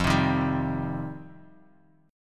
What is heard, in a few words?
F 7th Suspended 2nd; F7sus2; strummed; JGuitar